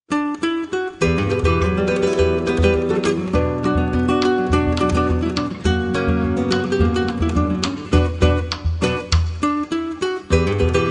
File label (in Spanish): Dos Guitarras; Acoustic Guitar